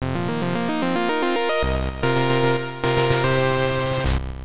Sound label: Fanfare; Lodoss Tou Senki 2; PC Engine Super CD-ROM2